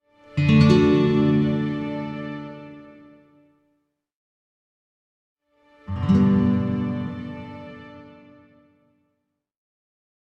Music effect - Classical guitar chord strums w string pad; Hollywood Edge Sound Designers Tool Kit